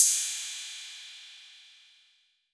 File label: PERC 808